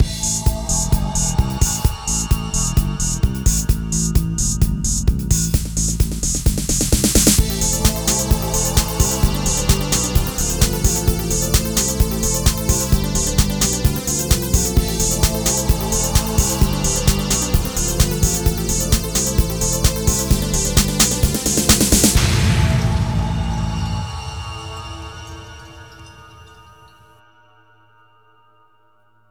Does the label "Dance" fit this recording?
Yes